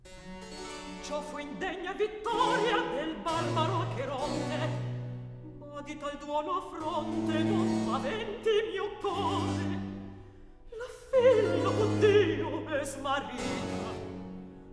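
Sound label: Recitativo, Handel opera; Bernarda Fink; Act 2; Cio fu indegna; Handel - Rinaldo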